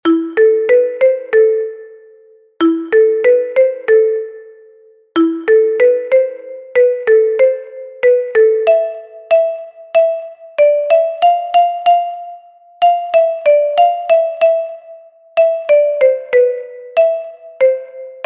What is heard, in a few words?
kalimba; cav bella